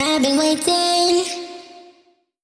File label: phrase Bbm, Vocal; Slate Digital Omnivox Vocal Sample Pack